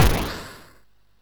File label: SFX, MOS6581; Narco Police; MOS6581R3